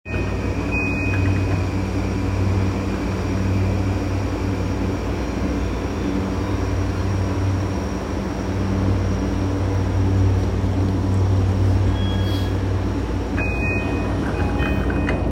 JR横須賀線北鎌倉南, train sounds

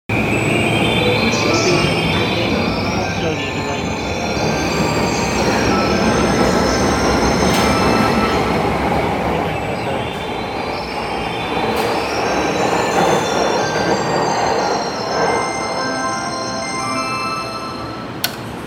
京浜東北線東京南, train sounds

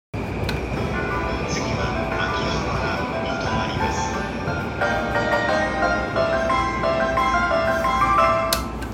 京浜東北線神田北, train sounds

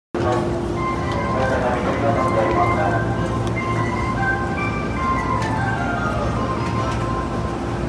埼京線 池袋 北, train sounds

